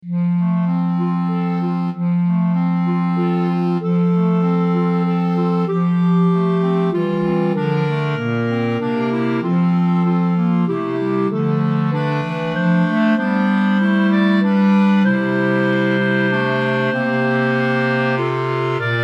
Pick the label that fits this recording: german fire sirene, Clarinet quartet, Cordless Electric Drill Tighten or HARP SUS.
Clarinet quartet